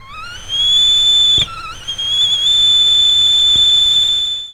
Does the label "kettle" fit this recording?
Yes